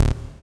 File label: SYN Poly D; Best Service Gigapack 1